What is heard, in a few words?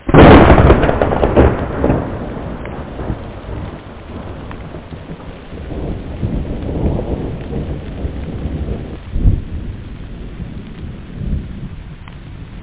thunder; Rain Anim